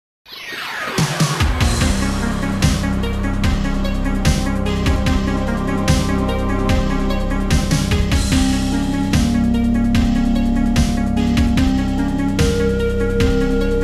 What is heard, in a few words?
Crash and Burn (Game Over); stargunner soundtrack